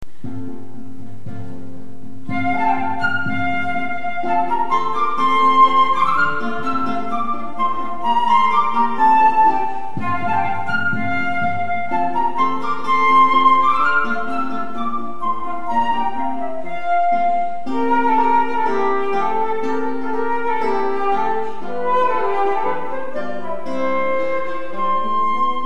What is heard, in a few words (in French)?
tambourin; F. Gossec, Tambourin